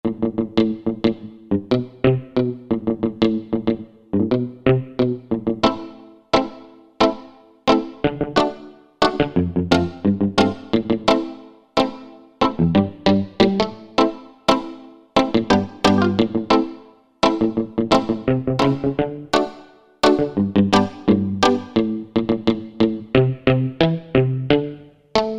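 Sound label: Muted Guitar, Korg MSC-6S Fretted Insts; Fretted Insts 1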